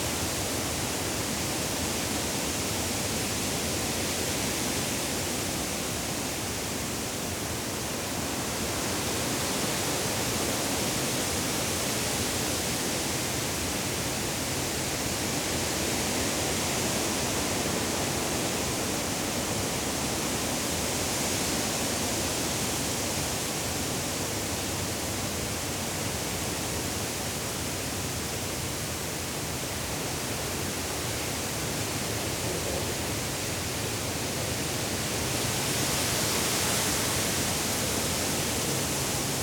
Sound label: Thunderstorm Rain